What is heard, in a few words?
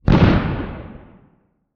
Explosion Napalm; Fire and Explosions